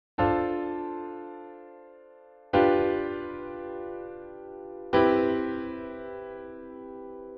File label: ii V with voice leading